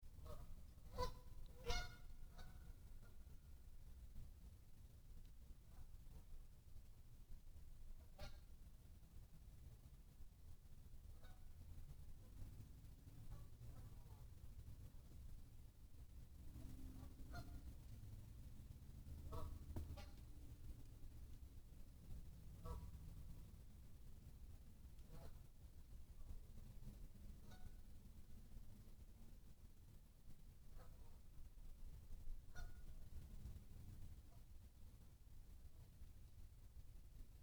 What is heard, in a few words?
Wild Geese